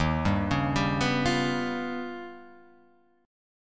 D sharp Augmented 9th D sharp

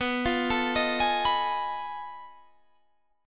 F 7th Sharp 9th Flat 5th B

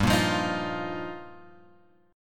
G Minor 7th Sharp 5th; Gm7#5 Chord {3 1 3 X 4 1} strummed

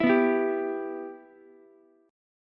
B Suspended 4th Sharp 5th B Ukulele; Bsus4#5 strummed; JGuitar

